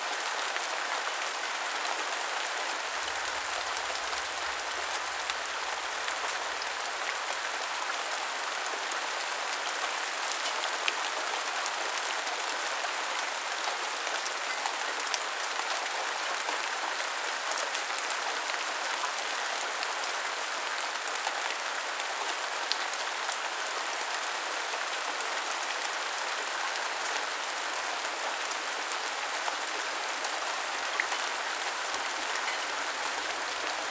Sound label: light rain loop; mixkit; cozy